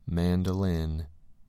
mandolin

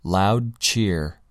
loud cheer

